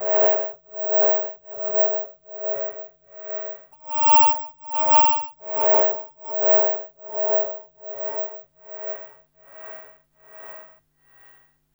Hip Hop R n B; USB Soundscan vol.19; AKAI

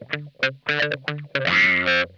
Funk Guitar; Zero G - Funk Guitar

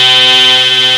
FUZZOR C2, SYNTHESIZER; GLUNGE

